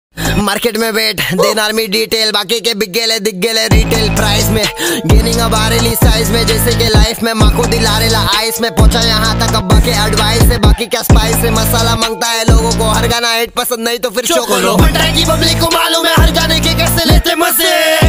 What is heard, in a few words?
Rap ringtone, hindi rap ringtone